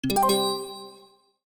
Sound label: chime